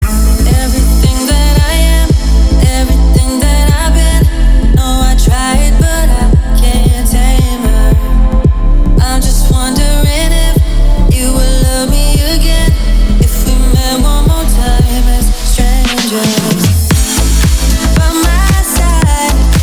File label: speed up